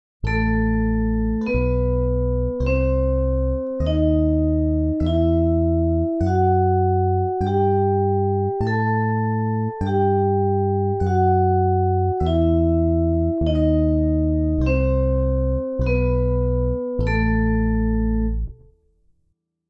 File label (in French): la gamme